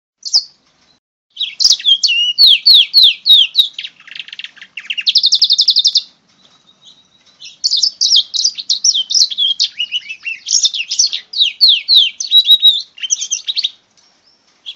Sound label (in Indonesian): hibrid housefinch x kenari